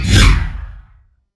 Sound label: turbine on